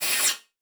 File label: Multimedia Internet CD-Rom Flash Pop Up